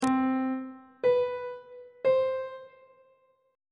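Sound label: c diminished 8th perfect 8th intervals; on treble clef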